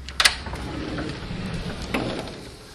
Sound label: door open; Golden Crayon Sounds